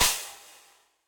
Crash FX18o16 Direct Natural, Cymbal; Drum Hits; Standard Hits; Session Drums Studio

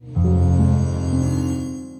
Cinematic Waves, Broken Keys; Instruments; Ableton; Factory Packs; Mood Reel

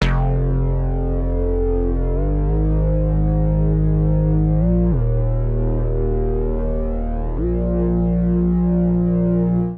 Woofer F Minor 98 bpm, Bassline; MIDI Clips; Tonal; Anxious; Ableton; Factory Packs; Chop and Swing

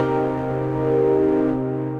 Rusty Old Chord, Synth Keys

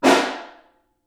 SN Far, Snare

